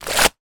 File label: wet towel drop on ground; foley; zapsplat